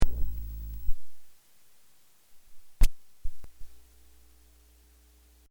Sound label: Sony Tube TV Turn On Off, Electric tick/click as tube TV switch on off; Noise Sound Effects; LYN095 package of Noise & Static; Lynne Music